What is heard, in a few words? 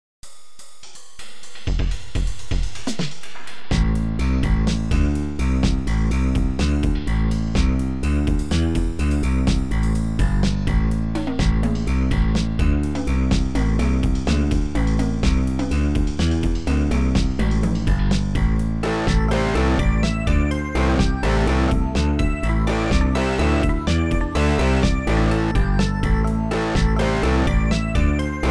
snow train